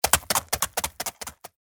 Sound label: goat Run